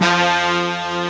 BRASS F1, BRASS SECTION; AKAI S6000 CD-ROM - Volume 1; BrassWind

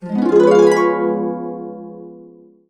DB, Complete Harps; USB Soundscan vol.62; AKAI

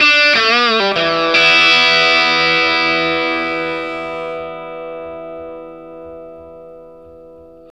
Vintage Blues Guitar, 60, E; USB Soundscan vol.22; AKAI

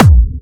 Clubby Kicks, Bassdrums; Vengeance; Essential Clubsounds Vol.4

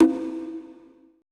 CONGA L; BPC DRUMS

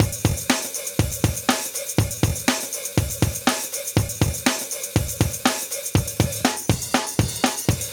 121 live breaks

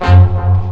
HIT BRASS

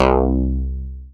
dance bass; Sound & Vision - Gigapack II CD 1

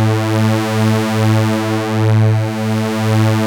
OB A3 -R, Rare Synthesizer; Hollywood Edge - Giorgio Moroder Rare Synthesizer Collection; OB 4 VOX.

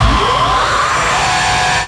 Kaos FX, Ambient Grooves

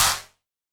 Wider Clap

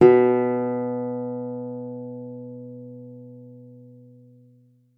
B2, GUITAR; Xsample VOL-2

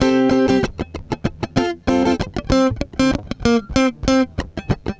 FUNK, FUNKY GTR

